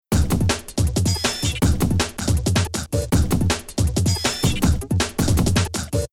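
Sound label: Rhythm DnB Loop pattern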